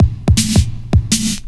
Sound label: Old Skool 162, breaks